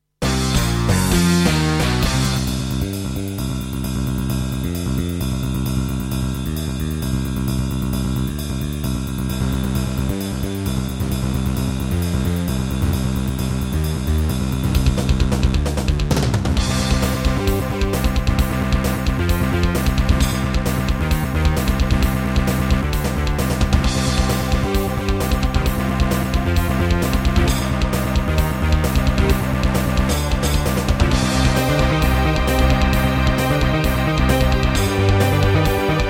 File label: Roland SC-8850; sigil doom episode 5